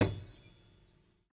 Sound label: piano diff